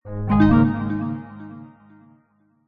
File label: multimedia alert bright warm system negative error